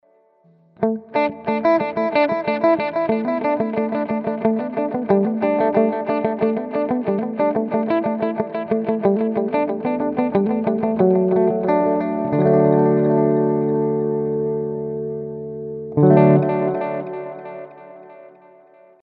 Ampero clean Delay

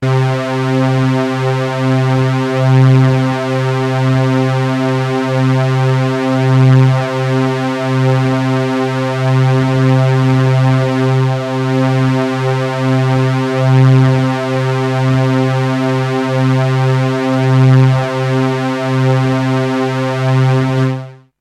Powersynth hearable long loop

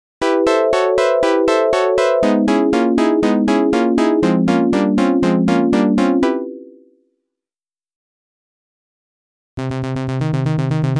sequencer

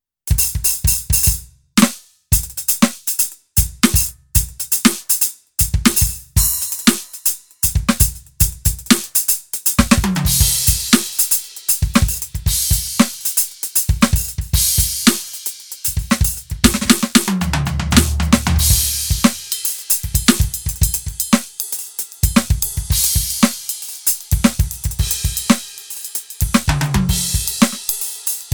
ROLAND TD 17 KVX Funk

